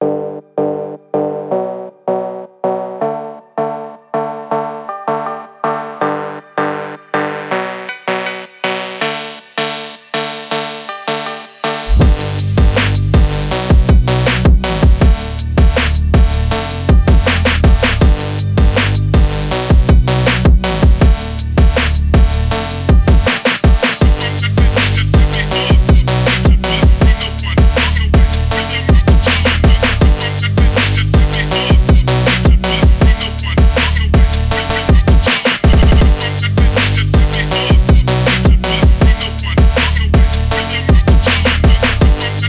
amen phonk soundtrack

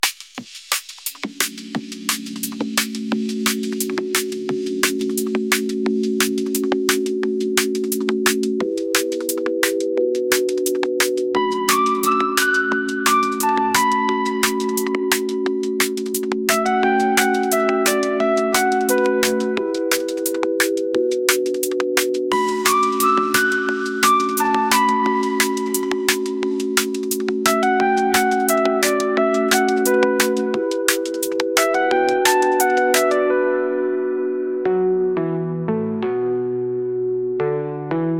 bassline fury bass

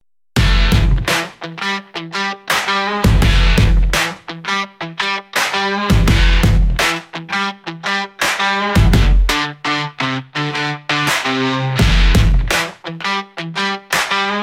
city beat

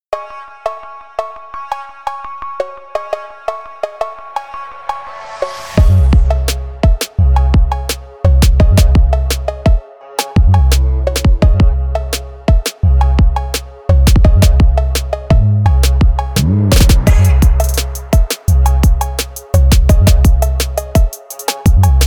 the phonk trap

